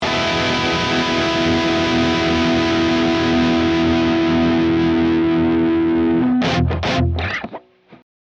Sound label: Power Chords, guitar